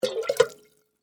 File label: Water Pour Chalice, Into, Metal, Cup; Pouring; BlastWave